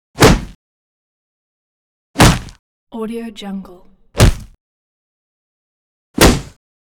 phone book hits multiple slams smacks

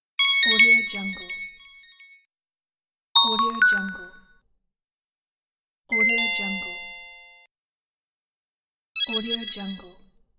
bright beeps and jingles

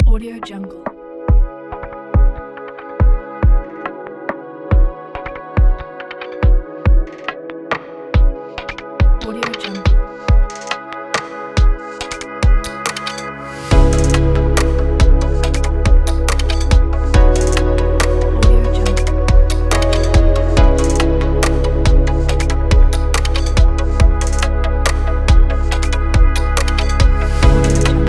atmospheric; a-a-t

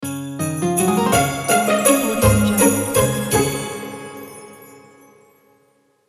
christmas sound

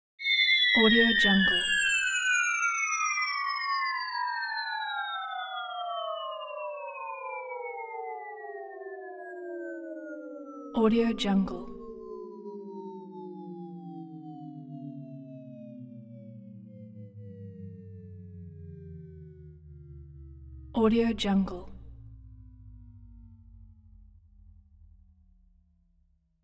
tone high pitch long descent; hollywoodedge

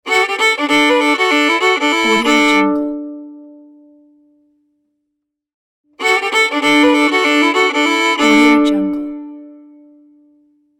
bluegrass fiddle logo; applehillstudios

